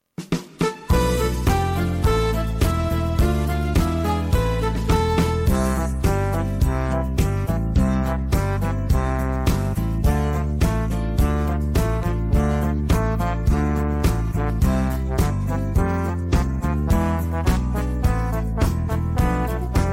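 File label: backing track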